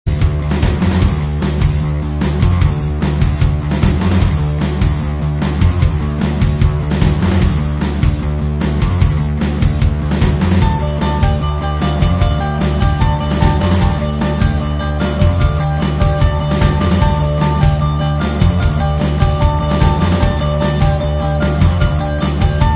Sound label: guitar rock cover; Last Ninja 2 (the mansion)